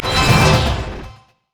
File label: orb of deception; LoL; ahri skin04